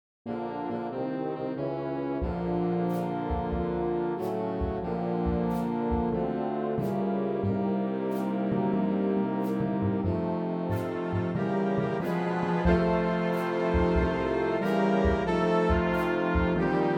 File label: Just a Closer Walk Fanfare